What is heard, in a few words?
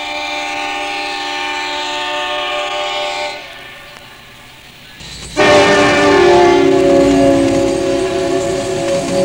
Doppler